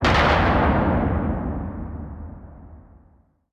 Story Explosion

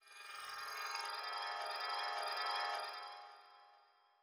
flashback Transition

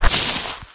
Grass Walk; unsighted

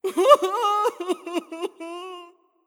Hero Escape E

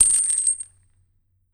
Spectral Chains Hit

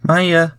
Chorus voice; Frogsong

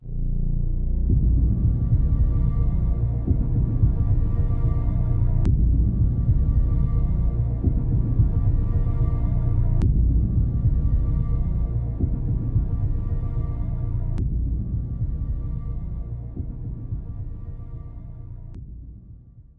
tension; mus a1p1 a02 atrium s05 security; Quantum Break

